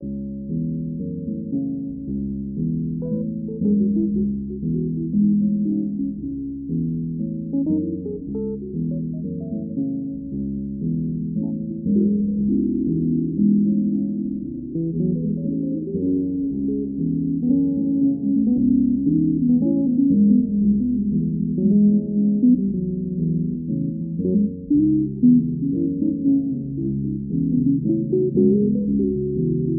theme lowpassed; Pitch Black - A Detective's Demise

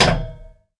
ELEVATOR Stop; mono; Universal Sound FX; ELEVATORS & LIFTS

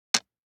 DICE on Plastic, Drop, Standard, 5 Five Dice; Samples; ArticulatedSounds; DICE Sfx Party (Extended)

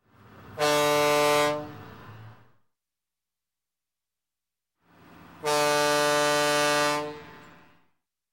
Marine, Horn Tug Boat Horn, Single Blast; Sound Ideas; Series 2000

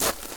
gravel; firefight

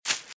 Velociraptor - Footstep - Grass - Run - Left; RadwinIsland

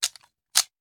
Machine Gun Foley; Sound Ideas; Guns

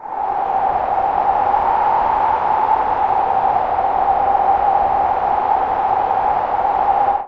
WIND; XXL 800 Production Effects

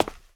concrete; firefight

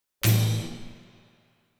Drum Hit Symphonic Drum And Thin Cymbal Hit; Hollywood Edge; sound designer's toolkit v2

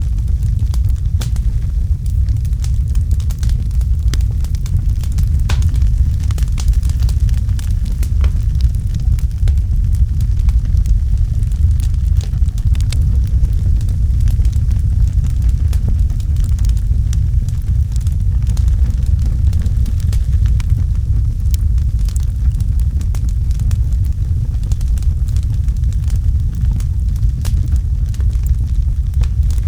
fireplace; DeadFright

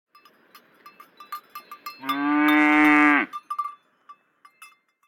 animals cow; Blind Drive

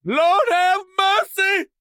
town human far; Blind Drive

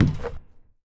Street Ball Acrylic Basket; SFX Kit

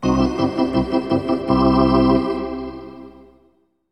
Hockey Organ

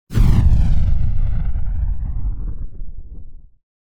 Cannon Fire Deep Firing; Hollywood Edge; sound designer's toolkit v2

